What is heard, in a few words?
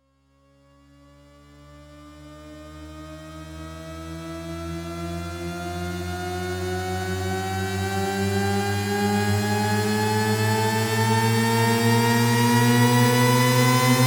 Fuzz Factory Shepard